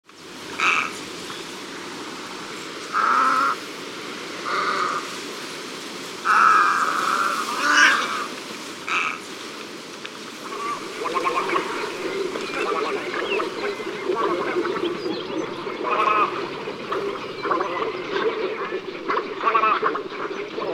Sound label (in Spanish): garceta